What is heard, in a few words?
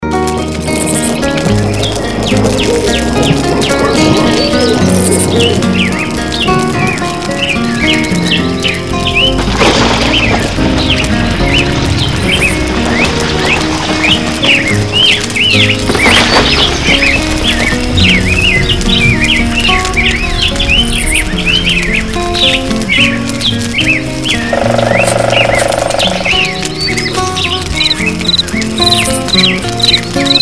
at fire place